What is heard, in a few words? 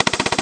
attach guns